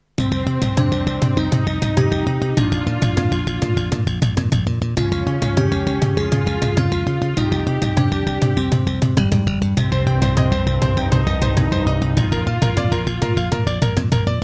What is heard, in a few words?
Roland SC-8820; Introduction; Hocus Pocus